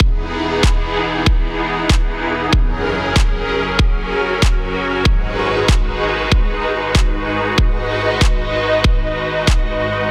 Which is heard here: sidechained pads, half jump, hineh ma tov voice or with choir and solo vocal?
sidechained pads